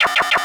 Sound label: Science Fiction Sci-Fi Electronic Short Computer Calculation